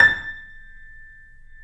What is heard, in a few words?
A, Piano; InVision Interactive - The Piano